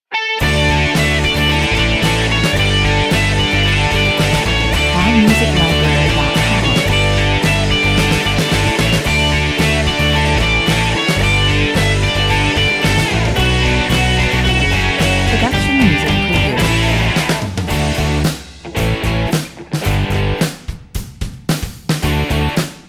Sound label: highschool rock 111 bpm